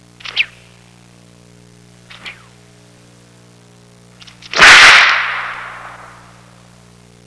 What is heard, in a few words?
WHIP CRA